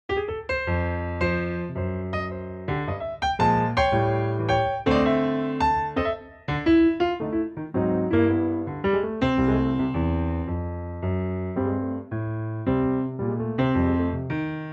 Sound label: C piano